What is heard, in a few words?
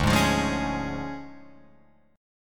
D Minor Major 7th Flat 5th, D, down, Guitar Open F; DmM7b5 strummed; JGuitar